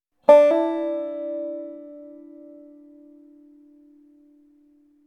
das hammer on

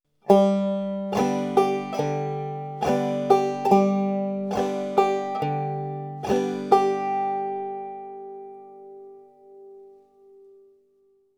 bum ditty mit wechselbass

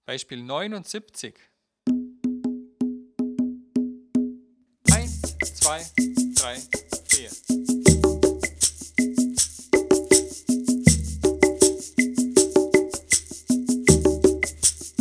viererrhythmus

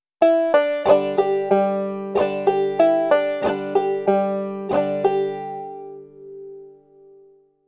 gegriffene pull offs und bum ditty

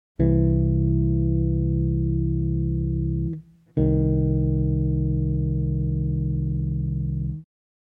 dezimen am bass

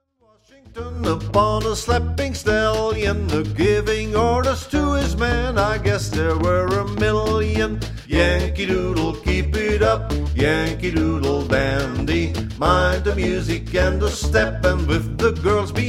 yankee doodle ohne ukulele

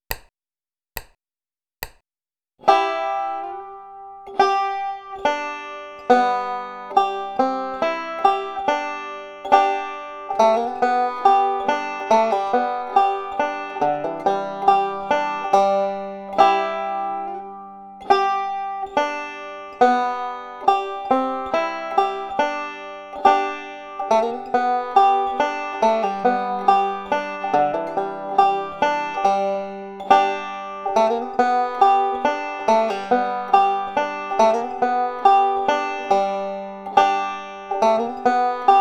cripple creek mit hammer on pull off und slide

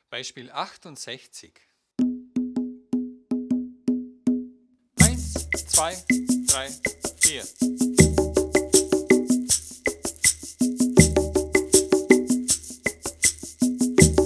viererrhythmus

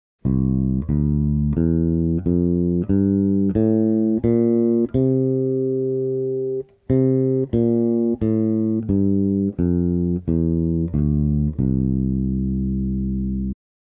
mixolydisch

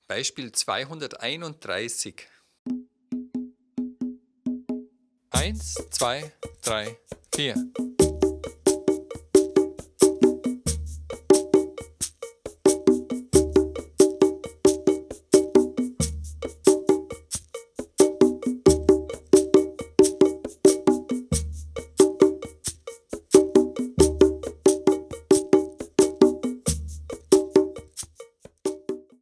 dreierrhythmus

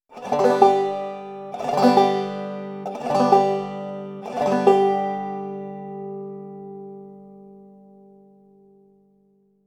roll im takt spielen

